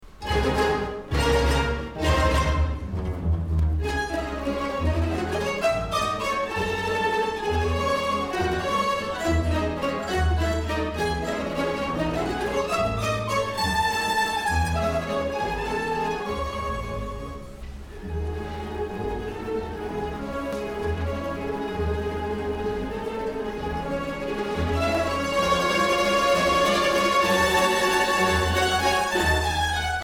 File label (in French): Illustrations Musicales Valse, mandolines